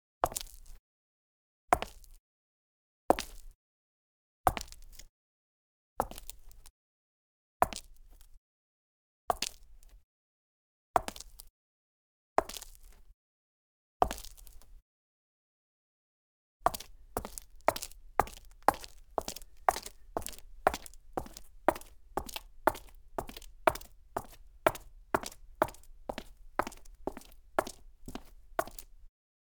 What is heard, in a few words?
Cement Gritty High Heels, Foley Footsteps; Sound Ideas